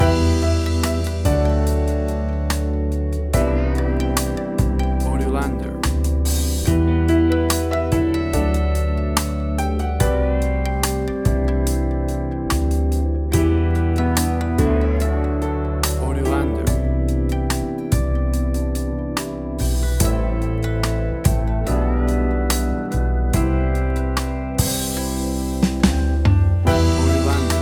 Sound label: Chilled Out Nashville Pop